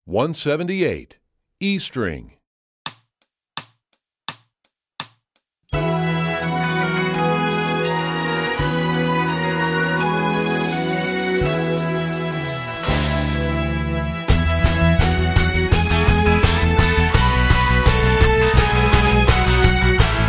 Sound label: E String, Strings; EE2000 Book2